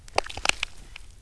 foley, footsteps; physics; undying

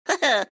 laugh; tinker

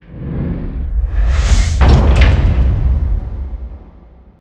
wheel intro; ui; economy

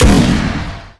rocket barrage fire; weapons; dota; gyrocopter

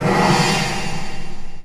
shadow poison impact; weapons; dota; shadow demon

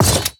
metalblade equip; inventory

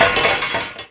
foley, footsteps; rattletrap

